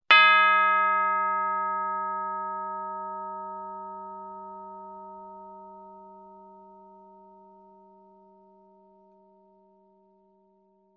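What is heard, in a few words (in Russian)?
колокол для идеи или акцента; Короткие звуки для монтажа — для transition, движения, смены кадра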